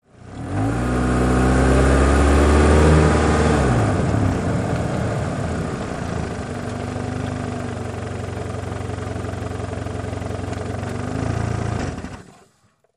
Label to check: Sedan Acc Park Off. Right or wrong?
Right